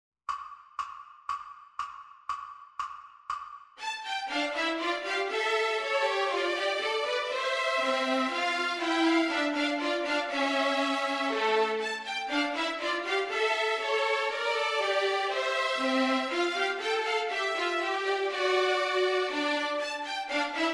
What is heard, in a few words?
Backing Track; The New Round O; ViolinSchool